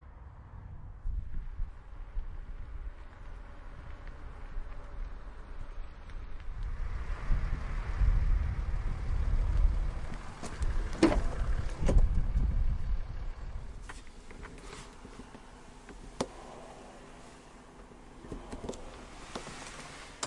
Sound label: motorcycle acceleration